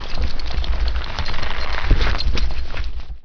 fremen tent build; Emperor - Battle for Dune

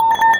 big reward

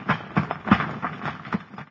building rubble, streetwar; ambient, levels

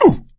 hurt minor, survivor voice; Left 4 Dead 2; coach

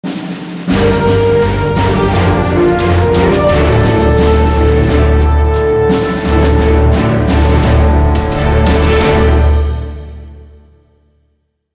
round mvp anthem, music; Counter-Strike Global Offensive; valve csgo

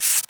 sticker scratch; Counter-Strike Global Offensive

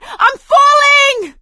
ledge hang end; Left 4 Dead 2; producer

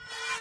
shutter, materials; Garry's Mod; hl2

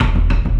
level, scavenge, music; Left 4 Dead 2

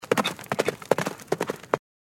Horse; Age of Empires III

